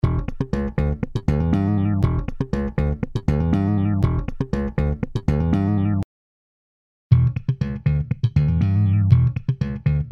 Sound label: Bass